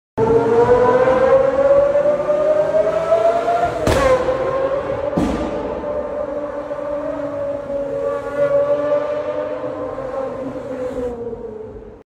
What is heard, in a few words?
Pagani Zonda